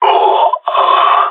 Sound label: ct death; Counter Strike Model Pack; CS:GO; gsg9